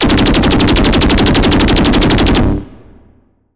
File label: SFX - Biochemical Gatling Gun; Routed Sound; Sylenth1 Expansion; Chaos Bringer Vol.1